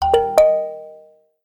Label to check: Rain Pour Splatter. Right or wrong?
Wrong